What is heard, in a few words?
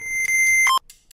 death sound effect; coryxkenshin try not to laugh